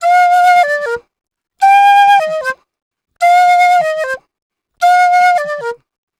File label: Penny 075bpm Bb, Penny Whistle, African